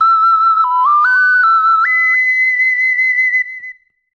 Ocarine; legend of zelda the link s awakening 2019 remake gamerip

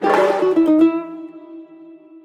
Mariachi Guitar Solo; gamerip; WrestleQuest

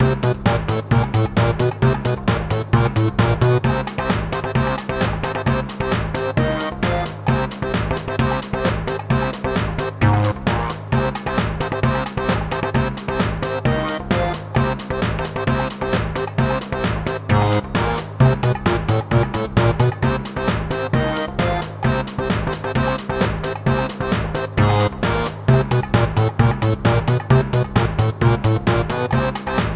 boss battle music loop

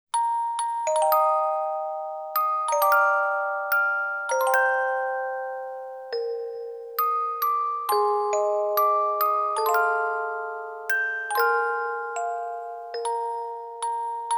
Organ; Zone of the Enders Z.O.E Dolores, i original score vol.2

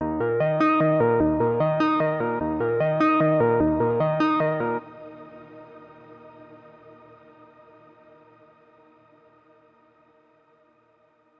Overlay Tone; Benefactor

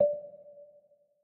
Move D 3 Mf; Mixolumia

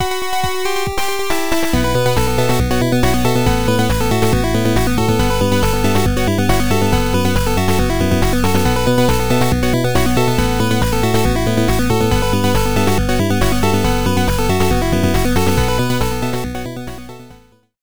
Disco; Midori Takano BGM 2

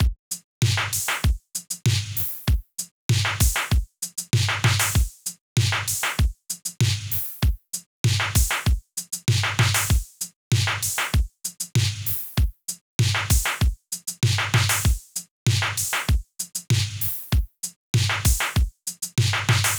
Main Drums variation 8 Bars; Vostok Inc.

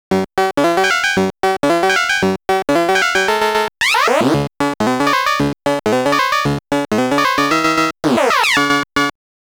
Coffee Break Music; Super Pac-Man

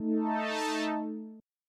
Tension; Vostok Inc.

